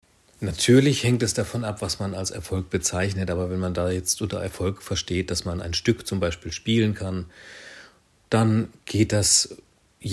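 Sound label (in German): klavier antwort, piano answer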